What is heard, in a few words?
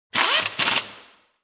Heavy Driller Revving sound